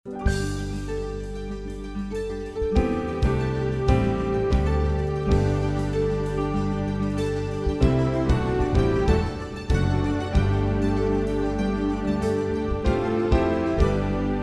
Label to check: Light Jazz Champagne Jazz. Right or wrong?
Right